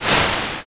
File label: swoosh